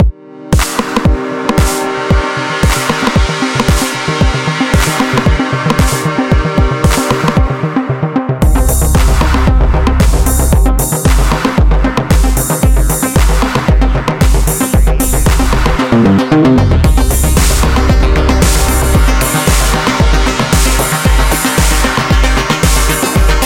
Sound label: microbrute spacehouse; arturia